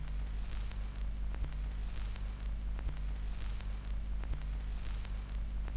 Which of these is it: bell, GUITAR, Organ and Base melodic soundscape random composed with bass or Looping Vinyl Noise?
Looping Vinyl Noise